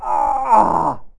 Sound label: ouch